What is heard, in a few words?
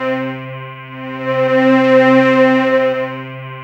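synth Morpheus; Sound & Vision - Gigapack II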